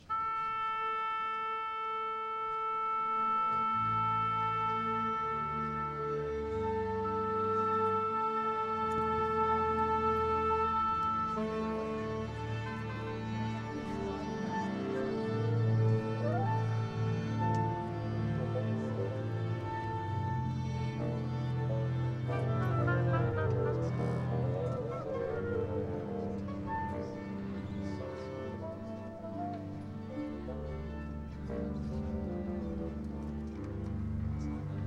Orchestra Tuning Instruments At The Parque Do Ibirapuera